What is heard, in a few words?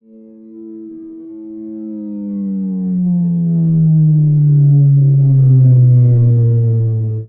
Hand Drum Reverse Slow Down; Soundopolis; Percussion